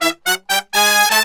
120 AM R, BRASS SALSA; Best Service ProSamples vol.14; AKAI

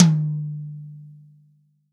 TOM, Real Drum Kit, POP KIT; REAL DRUMS; Best Service ProSamples vol.43

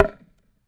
Thunder Drum; Sonic Foundry (Sony Creative Software); The Electronic Music Manuscript Collection; Battery 3 Sample Kits

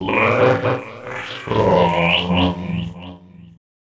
Fx Vox; Equipped Music; Loungin House

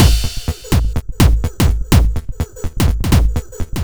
125 No Snr, Drum Loops 125bpm; Zero-G; Soundclash Nu Dance Electro; Acidized Wavs; Krakenhausen

